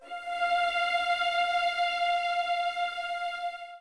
pad; ensoniq.gs1

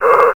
Noise; alone in the dark 4

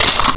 over button

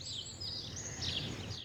torch

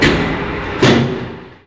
elevator end; SiN; environment

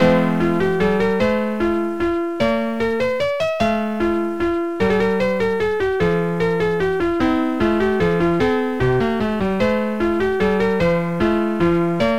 minuet; SoundMOD Volume 2; mtm

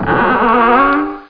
CREAK; Solcity

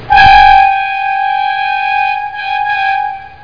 HORN BIG, wheels

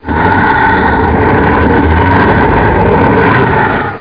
growl; Hexen II; eidolon

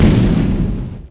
IMPACT F; Dkedemo

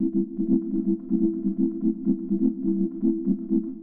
SYNTH PAD HOUSE 125 A; eJay; Sphere

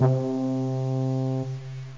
roland d50 bones, synths; Zoom - Release 2 (1996); Active Software

